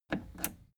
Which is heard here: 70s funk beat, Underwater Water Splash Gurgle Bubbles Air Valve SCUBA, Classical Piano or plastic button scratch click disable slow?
plastic button scratch click disable slow